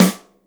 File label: snare, rock drum kit